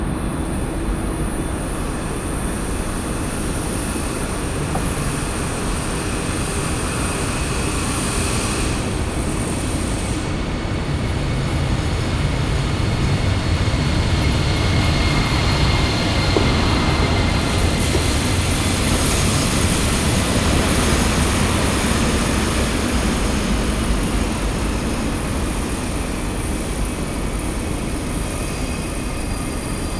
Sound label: Train Station, Binaural, Background Noise; TS 103 224 Background Noise Database